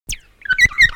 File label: north island kaka